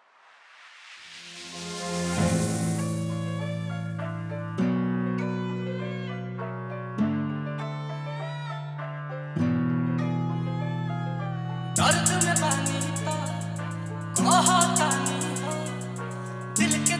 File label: Bhojpuri Sad Song Hip Hop Drill Mix